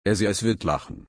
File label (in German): lachen